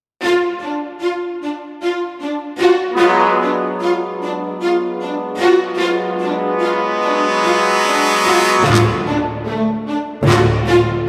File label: Stabs